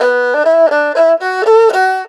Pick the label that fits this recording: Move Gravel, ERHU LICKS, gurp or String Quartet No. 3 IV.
ERHU LICKS